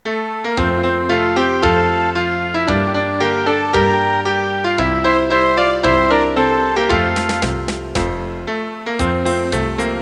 zirkuslieder eine zirkusshow ist lustig playback

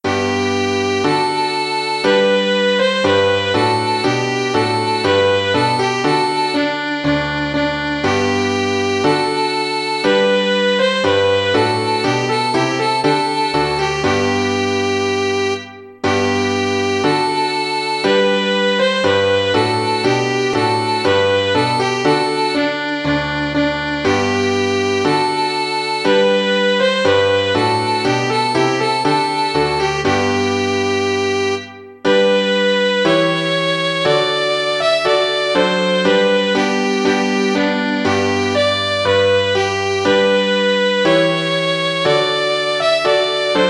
violine u klavier; trumpet voluntary

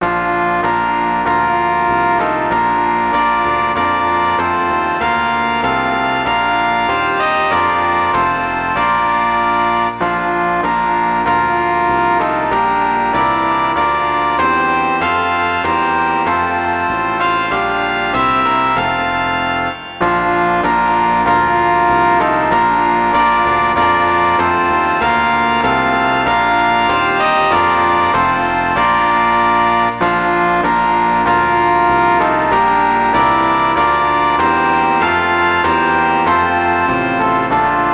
hoert ihr wie die glocken klingen blechblaeseroktett

